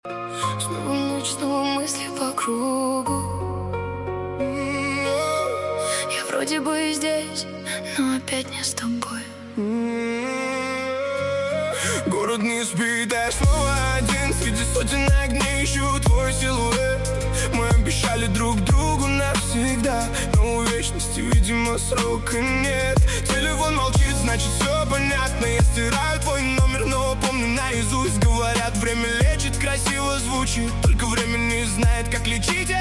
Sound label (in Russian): Grustnyj Repchik